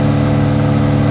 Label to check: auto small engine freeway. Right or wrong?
Right